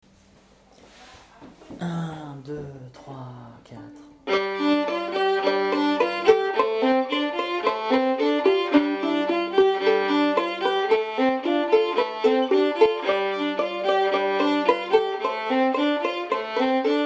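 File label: scottisch accordion; scottisch du 29 mars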